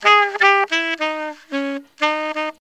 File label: Sax orient fragment